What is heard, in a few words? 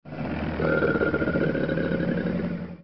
crocodile sfx